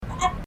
laugh

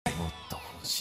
ring tone; ayato motto hoshin darou

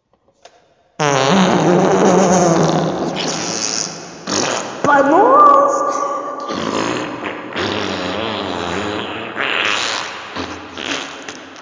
mega fart with reverb

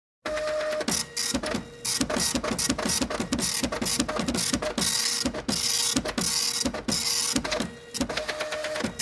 computer printer sound effect

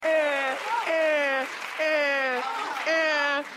eaaauuuhhhh

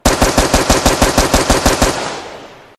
fast semiauto ak74

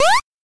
8bit jump

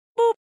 overwatch boop; sombra

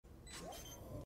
spike defuse

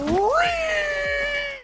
reeeee

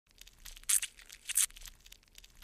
squeeze; lemon

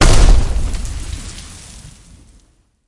npc dragon attack tail

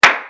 single clap sound

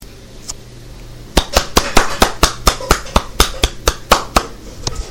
slap

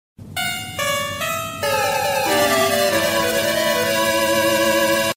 sleep jingle; danganronpa 3

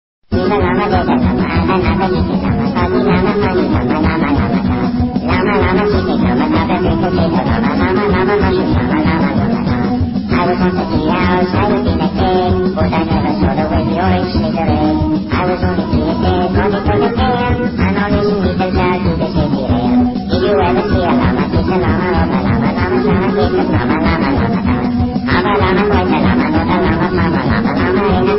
llama song

